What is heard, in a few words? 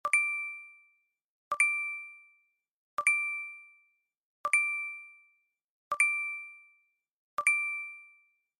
Apple pay ding sound effect